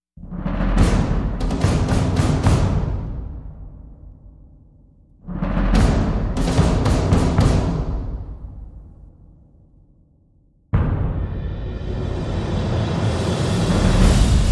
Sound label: Percussion and Rises